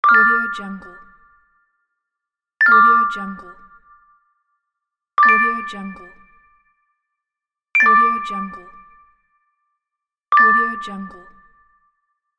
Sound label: notifications